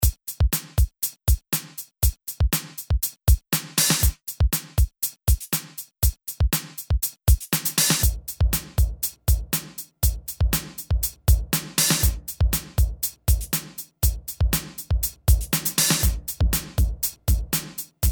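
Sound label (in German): Audified Synergy R1 drum loop verschiedene Presets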